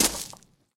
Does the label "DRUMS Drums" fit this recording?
No